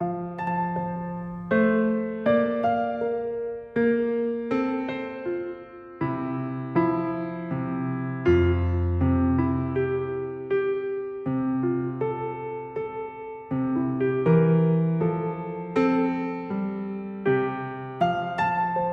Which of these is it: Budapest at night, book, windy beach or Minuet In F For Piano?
Minuet In F For Piano